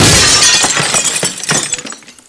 bust glass; debris

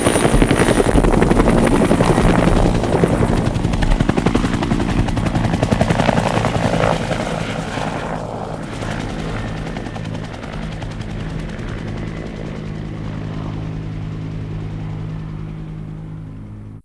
helicopter

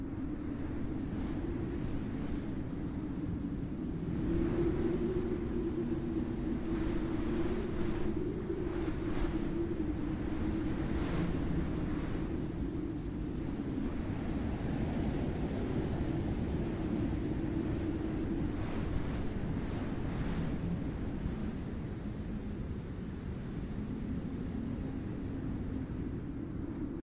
wind; docks

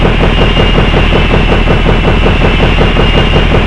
rotor

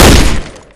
30cal shoot, weapons; svencoop; ww2projekt

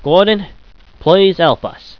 ahh, scientist

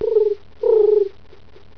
dove

